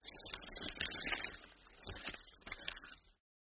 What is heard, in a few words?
paper rustling; paper mvmt